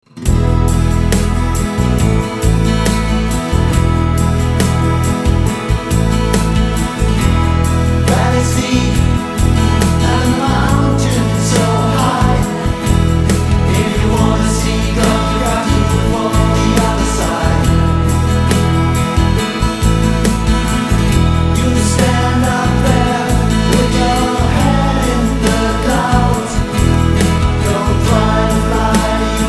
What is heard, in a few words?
Hymn